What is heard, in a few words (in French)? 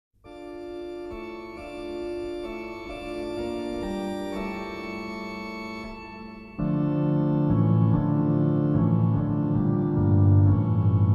interlude d'orgue